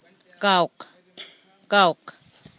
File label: kawk rufous night heron